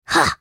Sad Mummy effort; Character Voices; English

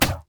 minotaur basicatk; LoL; alistar

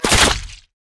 basicattack; jinx base

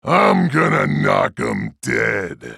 attack; Trundle

